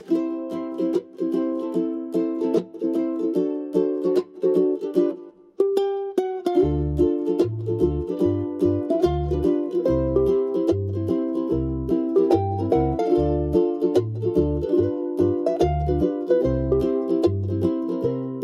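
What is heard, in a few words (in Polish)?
Muzyka Hawajska; Mele Hawaii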